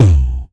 VOCDRUM, MOUTH; MOUTH PC

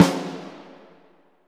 SNR SONAR, Orch Snares; Roland LCDP03 Orchestral Perc

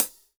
HH WET, Drum Mashines, SR-16 DRUMS; EdgeSounds

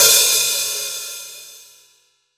PS2002 HHO, PS 2002 HI HAT

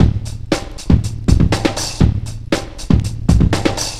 tom flams

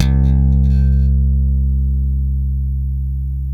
FUNK, Bass Legends; Spectrasonics

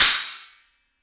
CYMBALS; 3D Sound; 3D Drums

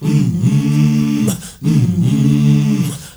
MALE, HUMMERS

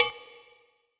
Agogo LO; MARKYDRUMS

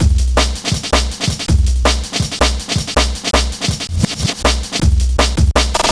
162, breaks; M8

